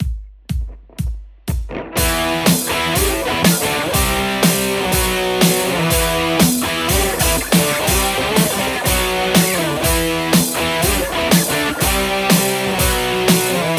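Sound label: Telecaster; igniteamps emissary v1 00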